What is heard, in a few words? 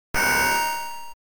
magic twinkle